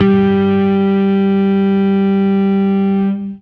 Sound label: Fuzz G harm